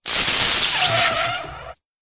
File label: lightning sound short